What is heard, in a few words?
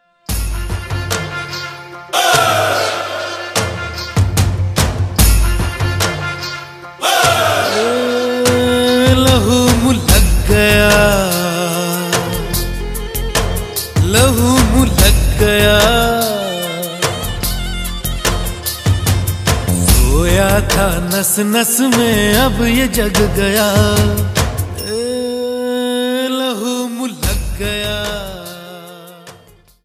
romantic; Goliyon Ki Rasleela Ram-leela; Bollywood